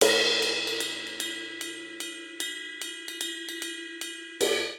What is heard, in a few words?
Swing Waltz Cymbals Only 3/4 150 bpm; MIDI Clips; Ableton; Factory Packs; Drum Booth